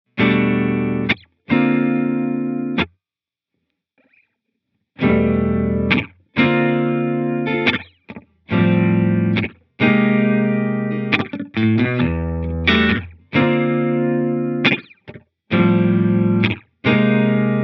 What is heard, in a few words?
Digital Short Stuff